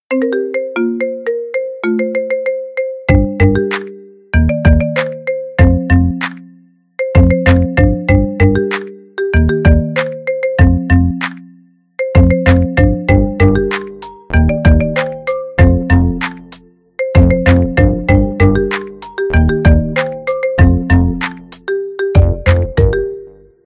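iphone marimba remix iphone remix on track from marimba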